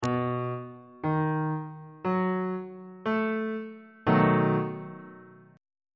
a double sharp dominant 7th chord; bass clef

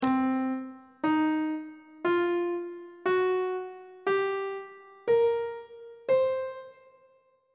c blues scale on treble clef

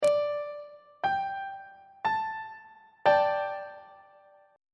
f double sharp suspended 2nd 2nd inversion; on treble clef

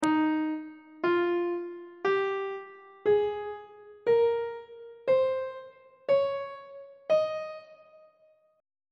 d sharp mixolydian mode on treble clef

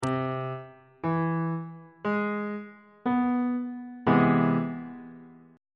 d double flat augmented major 7th chord; bass clef

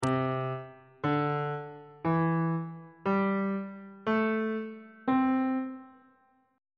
c major pentatonic scale on bass clef

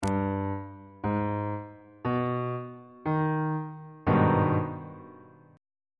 a flat minor major 7th 3rd inversion on bass clef

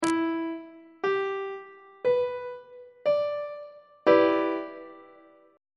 g major 6th 3rd inversion on treble clef

